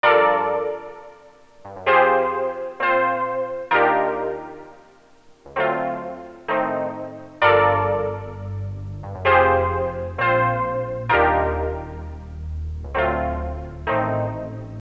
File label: LOFI CHORD